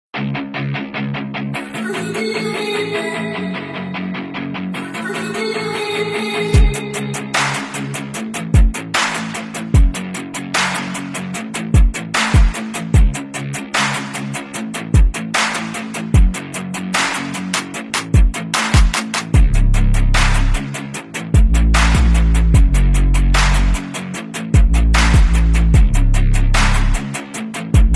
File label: Instrumental